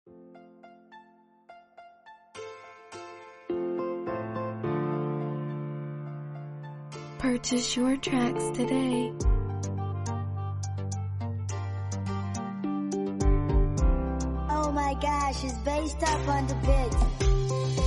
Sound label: Instrumental